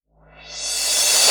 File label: Cymbals Reverse; Vengeance Samples